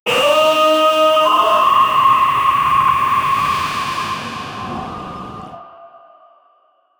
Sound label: cyborg